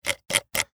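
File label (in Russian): колесо компьютерной мыши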